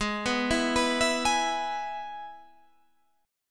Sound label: Ab Minor Sharp 5th Ab down Guitar Standard; Abm#5 arpeggiated; JGuitar